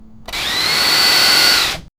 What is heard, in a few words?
an electric scooter start